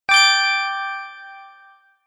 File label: Magic Chime Button; Button Sound Effects